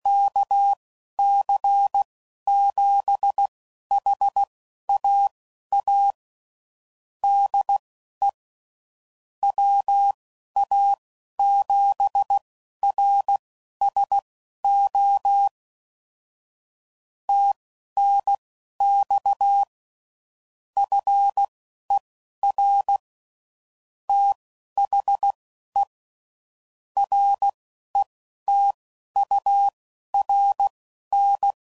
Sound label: CW Code Groups 10WPM, QSO; HamRadio; Morse Code; kc7haa